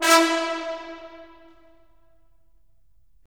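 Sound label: brass; LA Composer vol. 3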